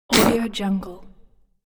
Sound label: stone troll hit